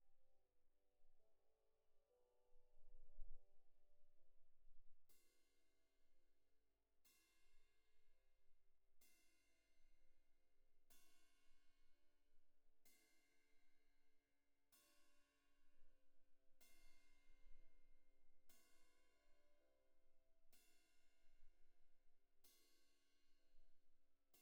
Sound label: Cymb2 Main